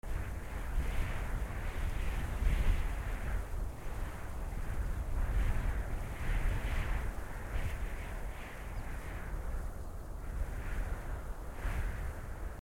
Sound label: Home Wind; children of morta